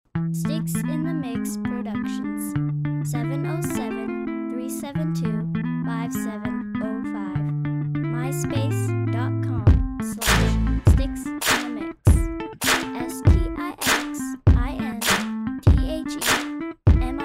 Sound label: guitar